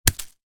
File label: sport baseball land on grass; zapsplat